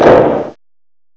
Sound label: CLAP; M8; CMI Factory